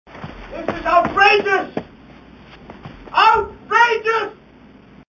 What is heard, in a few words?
ringtone; outrageous